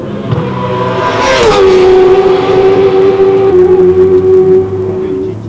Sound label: racebike; Squid on a track